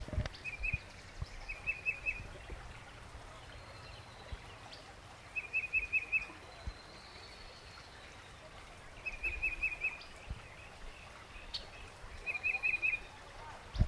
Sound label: tufted titmouse